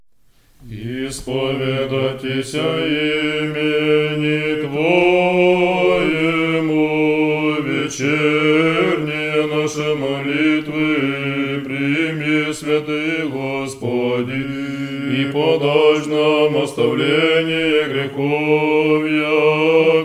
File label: resurectional tone 1; sundays